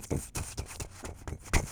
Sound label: Running Bird Footsteps